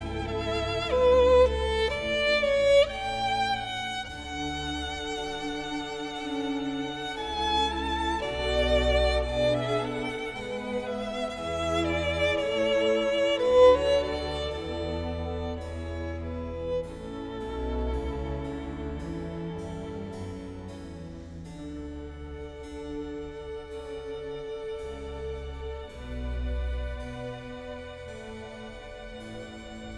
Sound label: Air (English Chamber Orchestra)